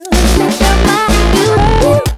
Break 4beats; Beatbuddy; Wii U

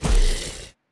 Enemy Woodsman Walk

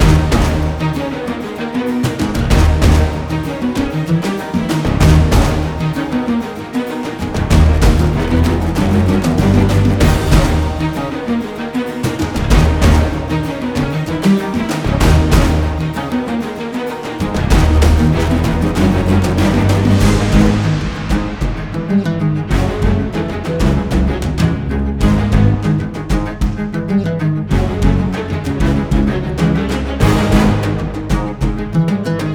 Land Combat Desert Day

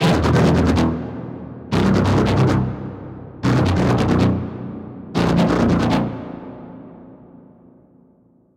Lead

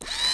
Turet

